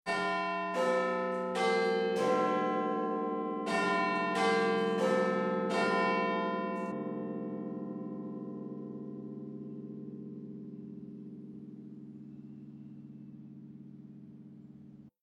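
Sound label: London street exterior day clock Big Ben half past; the 39 steps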